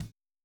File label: Boogaloo Chorus Tom Rack, 82 BPM; Drums from the Big Room The Mixes